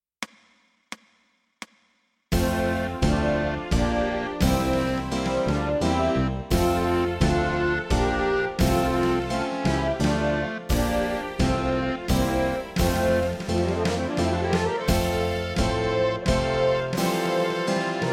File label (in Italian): Inno Inglese; God Save The Queen; Inno naz. Inglese; con base armonica; fisa